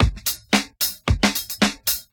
74 bpm old school funk breakbeat A key, kick tuned to the A note